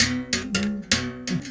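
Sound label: BS BERIM GRV, BERIMBAU; Spectrasonics; Heart of Africa 2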